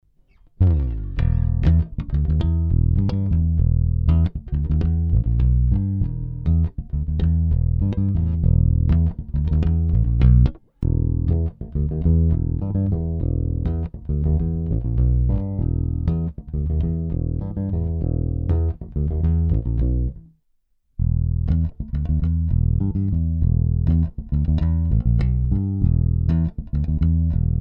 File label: Warwick Star Neck Both Bridge Pickup Neck Bridge Fingerstyle